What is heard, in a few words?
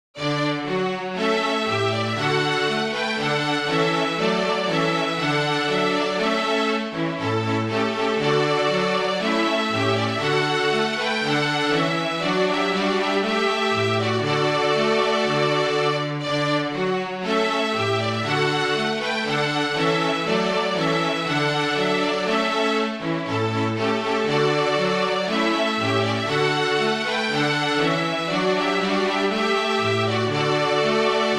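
Trio Trumpet Voluntary Clark, Flute Trio Version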